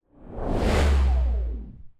Swoosh Transition

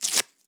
Tearing Rustle

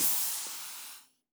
Spray Hiss

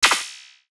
Sci-fi Energy Gun Blast

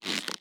Elastic Rubber Double Creak

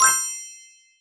bells upward, rewards

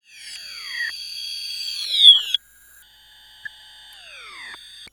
Combined Digital Hiss

